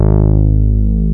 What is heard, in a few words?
C 2, MINIMOOG; Rubber Chicken Software Sample Bank